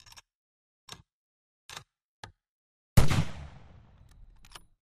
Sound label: SNIPER RIFLE, shots